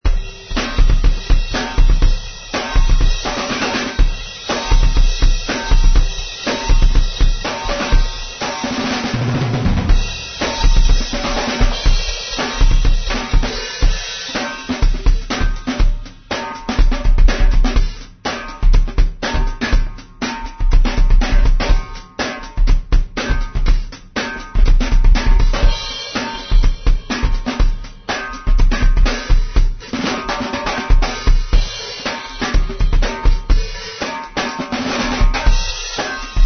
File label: Loud Groove